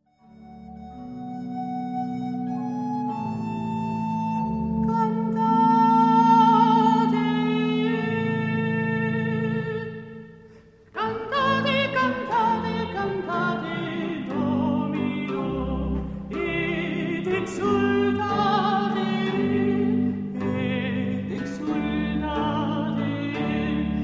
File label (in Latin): Cantate Domino